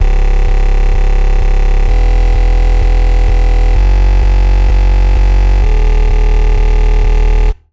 Bass, Spire; Routed Sound; Spire Expansion - Star Scavenger Vol.1

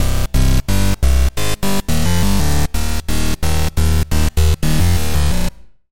Bass; Routed Sound; Sylenth1 Expansion - Hyper Gamer Vol.1

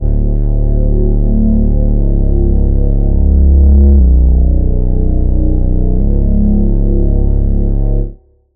Reese; Routed Sound; Spire Expansion - Street Vanquisher Vol.1

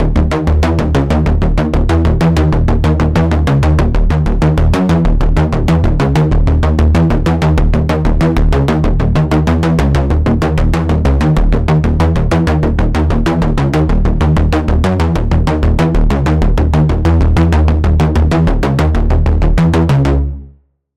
Pulse; Routed Sound; Sylenth1 Expansion - Quantum Enforcer Vol.1

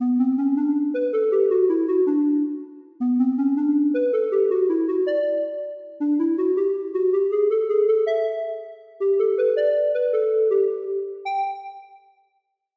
Woodwind; Woodwind - Jack Frost; Sylenth1; Routed Sound; Sylenth1 Expansion - Story Walker Vol.1

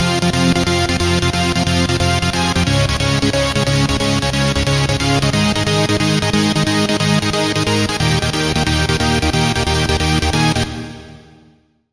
Chord; Routed Sound; Serum 2 Expansion - Star Scavenger Vol.1

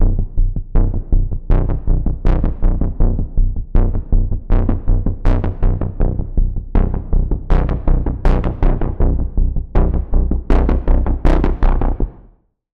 Pulse - Tension Ramp; Routed Sound; Vital Expansion - Quantum Enforcer Vol.1

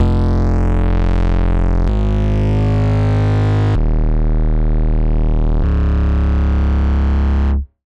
Bass, Sylenth1; Routed Sound; Sylenth1 Expansion - Star Scavenger Vol.1

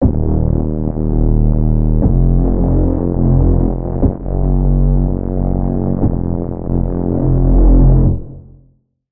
Reese - Relentless Fuel; Routed Sound; Vital Expansion - Street Vanquisher Vol.1

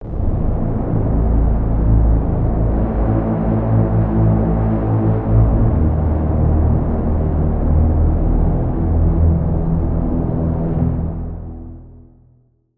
Pad, Serum 2; Pad - Ounce; Routed Sound; Serum 2 Expansion - Quantum Enforcer Vol.1

